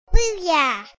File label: exclaim; Chibi Knight